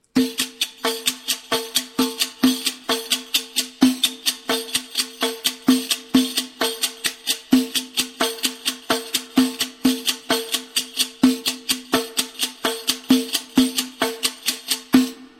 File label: riq